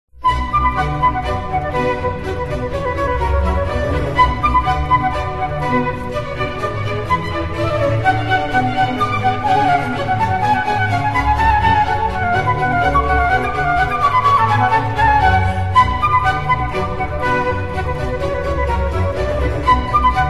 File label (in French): Suite no2 Badinerie, Flute traversiere; Jean-Sebastien Bach